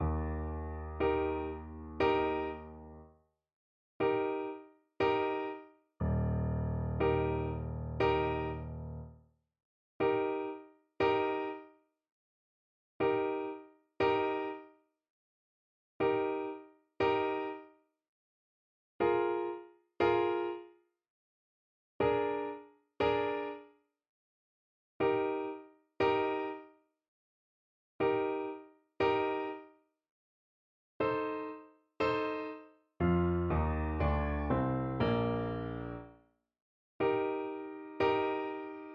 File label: saint saens elephant, double bass